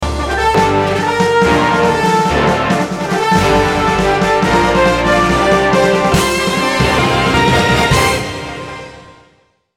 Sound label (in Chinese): 电视包装音乐